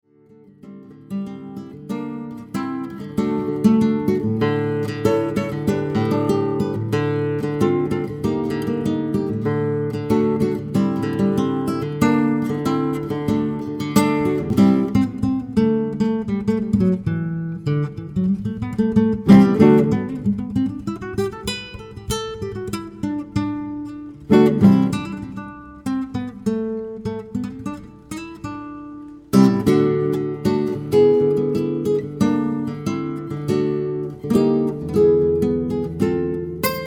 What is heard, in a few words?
strings